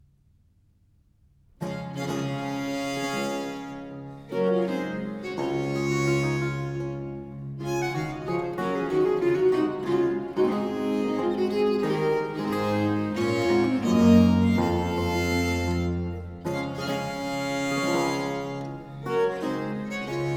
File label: suite no. 2 in four parts, in d minor major, viols; early music